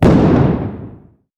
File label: shotgun fire; mossberg